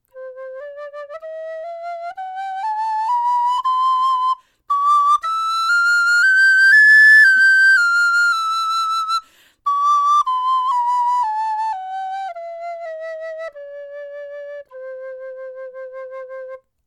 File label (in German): blockfloete sopran; meinl